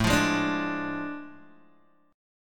A Major 7th Suspended 4th Sharp 5th, Guitar, Open G